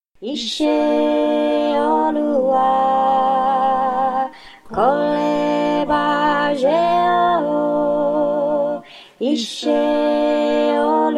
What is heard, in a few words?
Ise Oluwa alto